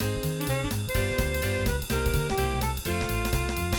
stem medium low

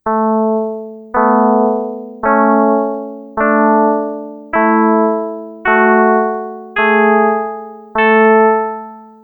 Scale Equal Chords

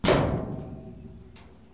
thud

